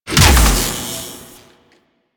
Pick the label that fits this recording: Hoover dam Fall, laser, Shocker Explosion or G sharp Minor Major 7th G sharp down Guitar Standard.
Shocker Explosion